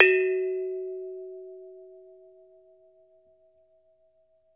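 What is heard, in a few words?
METALOPHONE, Pitched Percussion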